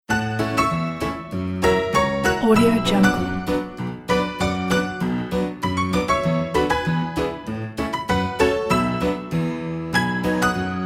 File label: positive ragtime